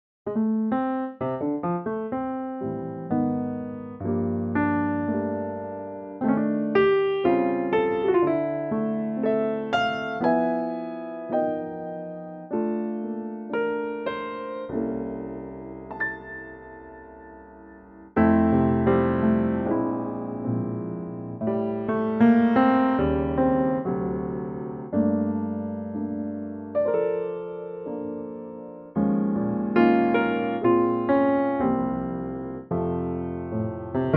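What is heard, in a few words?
F piano, male; key - F - vocal range - B to C; Pianotrak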